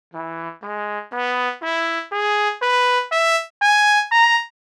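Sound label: truba, trumpet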